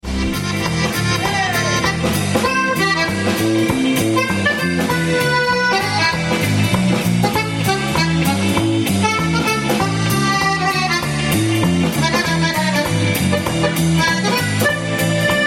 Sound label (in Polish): akordeon